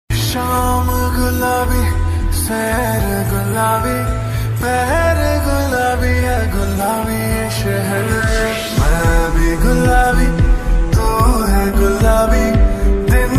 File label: soft love ringtone